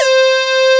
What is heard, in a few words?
PL MOOG; Wizoo - Powered Nord; STEREO LAYER